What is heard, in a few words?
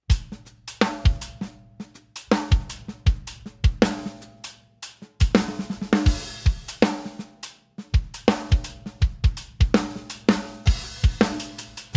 MEINL CRASHER